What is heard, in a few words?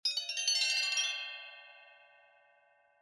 tone